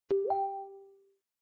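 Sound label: notification sound; facebook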